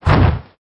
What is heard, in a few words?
wing flap